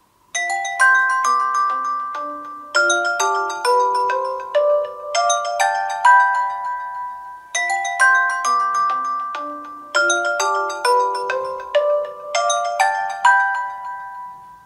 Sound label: Space Age Ringtone; Xiaomi